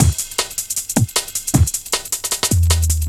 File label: Box Break, Jungle Breaks; Blu Mar Ten - Jungle Jungle - 1989 to 1999 Samplepack